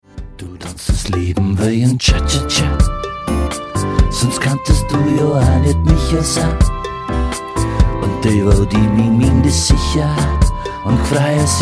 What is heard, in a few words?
cha cha cha